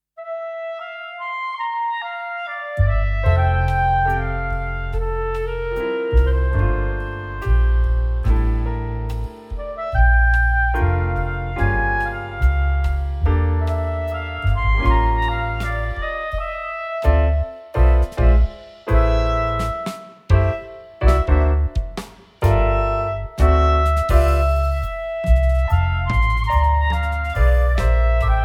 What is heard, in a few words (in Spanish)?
CLARINETE